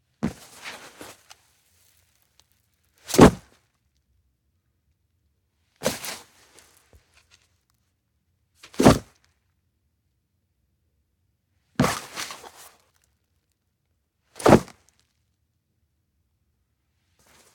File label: Neoprene - Wet- Wetsuit; TheWorkRoom Audio Post